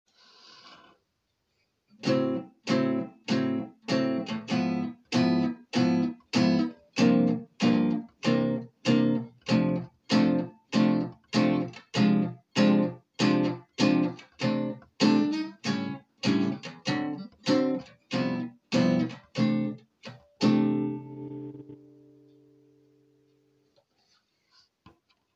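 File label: höfner archtop, guitars; other european guitars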